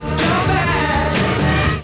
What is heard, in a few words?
Restore Dn